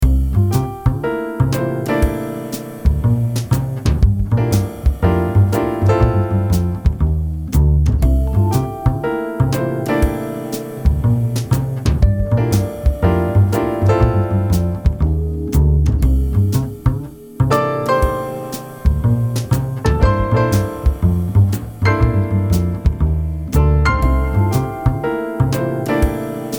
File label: Jazz